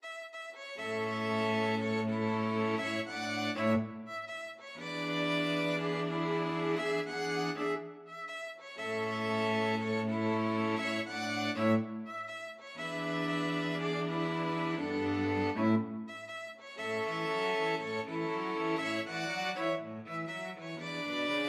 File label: string quartet